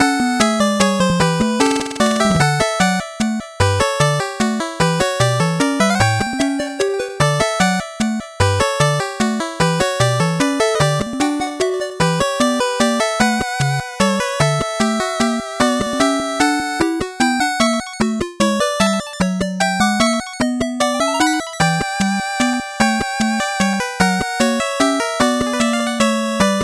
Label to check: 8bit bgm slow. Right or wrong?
Right